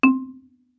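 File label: Marimba deadstroke fortissimo C2; MIS Pitches - 2014; Percussion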